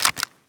ump45 bolt slap; weapons; swb

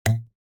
arrow shoot; obstacles; micromayhem

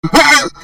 scream, zombie screams

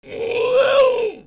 scream, zombie screams

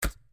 hitmark; blocks and bullets; hitmarker

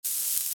fire; grubs

